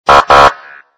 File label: gudok police sms